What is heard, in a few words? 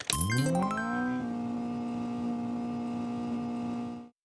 right answer car engine up rave